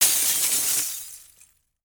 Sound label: GLASS Rattle Many Fragments; don t be afraid the first toy